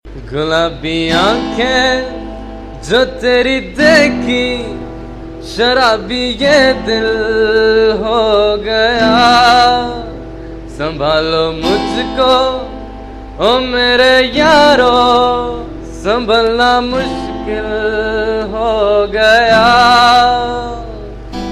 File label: 1980s Old